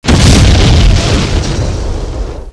booom; firearms

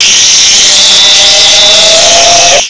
houndeye attack; gearbox

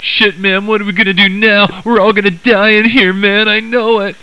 die; svencoop

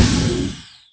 gauss gun

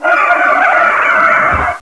die fight; rheptor

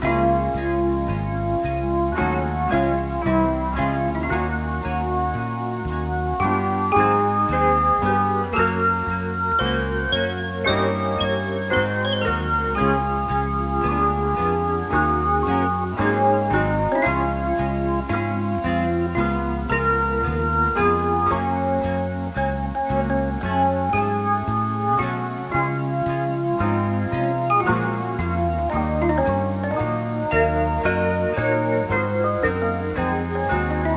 jazz; noutaja; normal

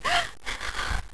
hit, medic; svencoop; paranoia

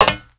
player metal; svencoop

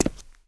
pl step, player

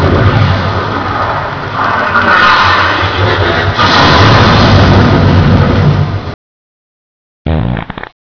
ambience; cstrike

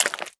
step

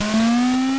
buun; svencoop; ub megaman

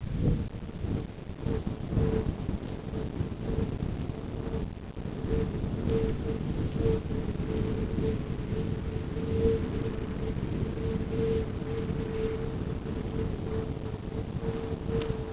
wind

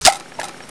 G43 clip out; dod

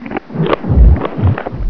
attack; svencoop; keen

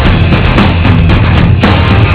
8bit, party

